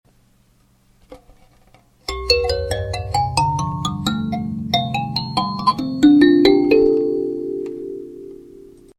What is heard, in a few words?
kin C, Kalimba; silvermooncreations